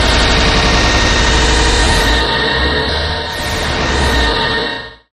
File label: Overload Pulse